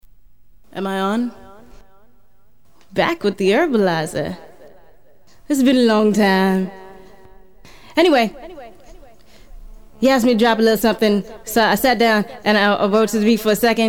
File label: acapella